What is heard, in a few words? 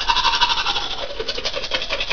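TOOTHBRUSH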